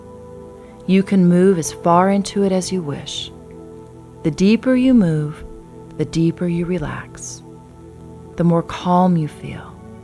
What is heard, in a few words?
Deepening music